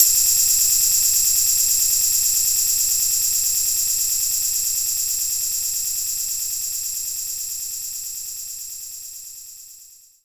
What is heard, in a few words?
Tambourine; knights try